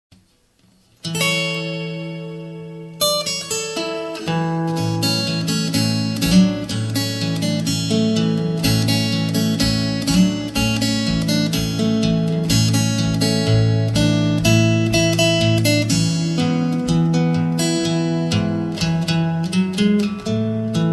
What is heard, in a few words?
fingerstyle